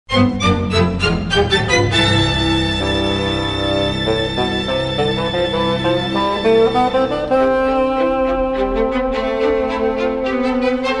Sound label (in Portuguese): Fagote